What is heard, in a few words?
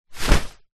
household umbrella auto open